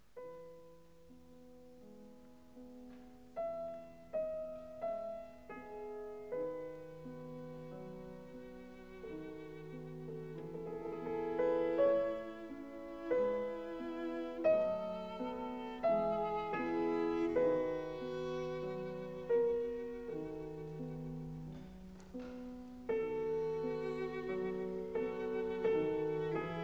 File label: Sonata for cello & piano in A major, Adagio cantabile; Sonata for cello & piano No. 3 in A major, Op. 69; III. Adagio cantabile